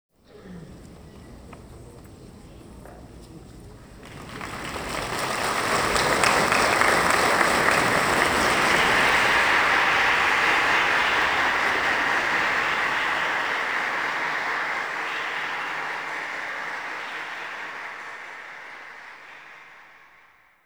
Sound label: Diorama Envy SFX; Warframe; Duviri; Dioramas